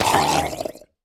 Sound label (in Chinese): 溺尸死亡声